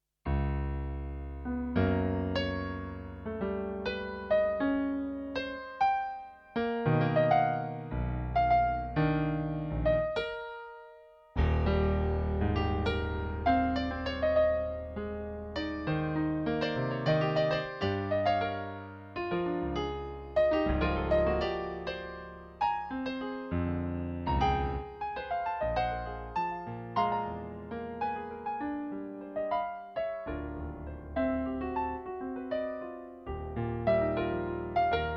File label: sweet asia piano